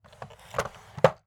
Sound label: Household Drawer Close